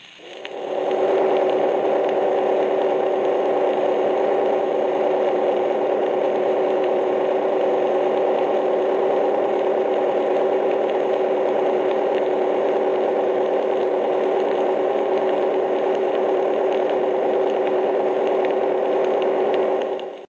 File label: Submarine Battery Charging